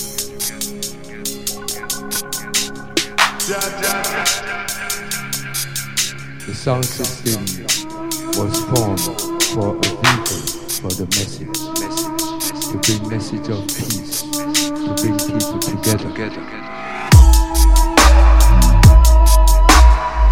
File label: flute